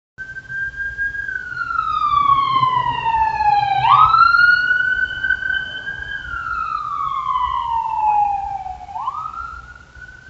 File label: siren passing